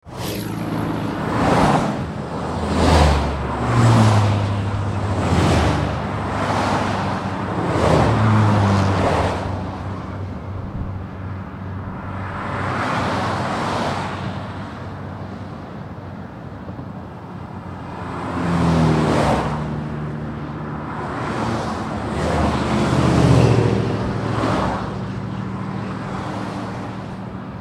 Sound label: Highway Sounds